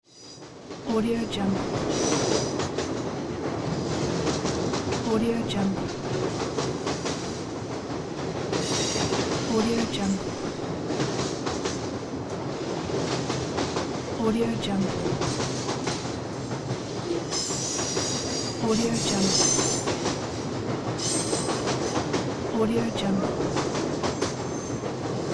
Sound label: train